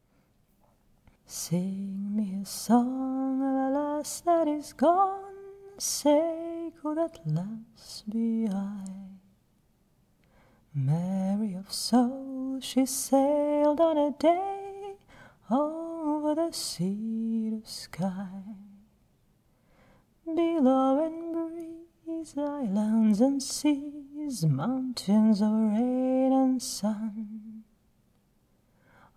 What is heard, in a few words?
Almost Singing, F; Outlander; June GWAB Singers' Collab - TV Songs